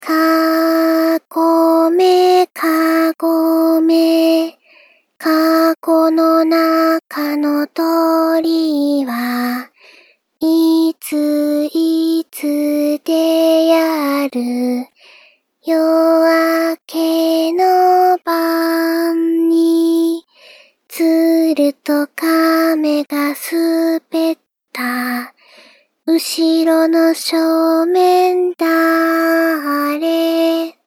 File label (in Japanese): Kagome Kagome No processing; Rei Yumesaki